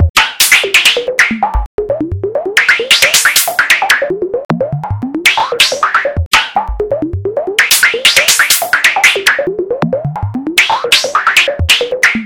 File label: Yamaha AN200